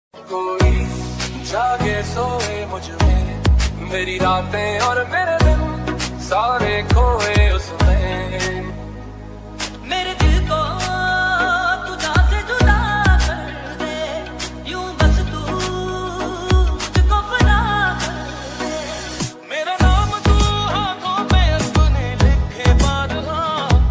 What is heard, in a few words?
romantic ringtone instrumental ringtone bgm punjabi ringtone